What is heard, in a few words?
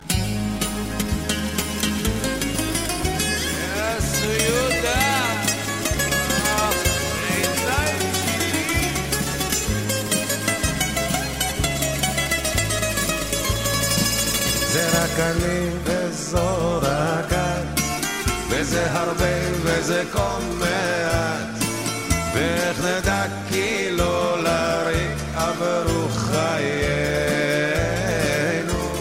buzuki, dance